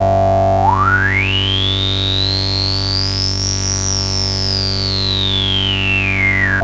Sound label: E-MU MOD 5 G4, E-MU modular; Greytsounds